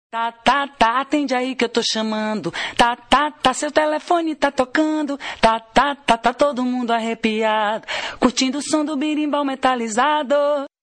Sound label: Berimbau Metallic